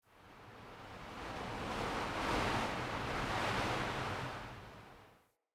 wind airy gust; Ambience; Under City

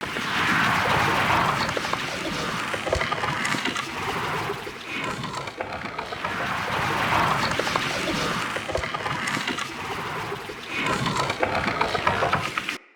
infested fishing fish swim loop; Weapons; Infested Fishing Spear

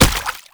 Proto Infested Step Water; Enemies; Steps; Warframe; Brachiolyst

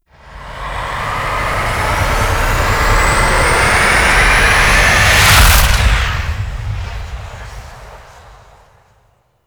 Extraction Depart, Vehicles, Flight; Warframe; Liset; Stalker Liset Skin

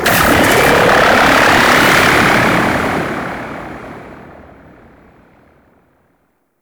Pursuit Space Ship Start; Vehicles; Warframe; Grineer

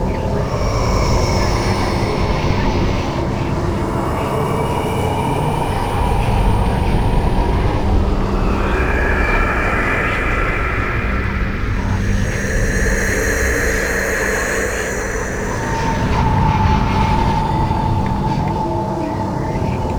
Grimoire Missing Page Loop, Ambience; Warframe; Entrati Labs

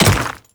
Dirt Step; Warframe; Grineer; Royal Guard; Footsteps

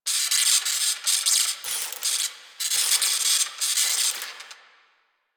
Foundry Build Loop; Interior; Ambience; Warframe; Nineteen Ninety Nine; Tailor Shop

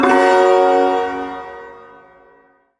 Ersatz Shawzin Yo Chord A; Warframe; Duviri Ersatz Shawzin; Chords

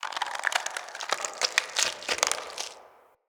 Root Cave Root Creak; Ambience; Neathuns Root Cave

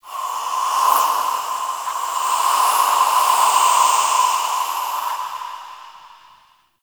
Zariman Steam Blast; Warframe; The New War; Ambience

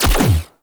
Crewship Turret Fire; Warframe; RailJack; Corpus Crew Ship

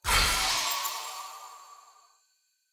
Long Sword Recall Button Push; Weapons; Player; Pothia Long Sword; Swords

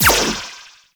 Choir Erupt Firing Eye

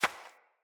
Hare Step; Encounters; Animal Rescue

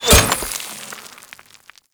Kunai Impact Dirt; Warframe; Hit Effects; weapons

